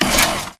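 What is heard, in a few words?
Stalker Movement Servo Damaged Walk; Titanfall 2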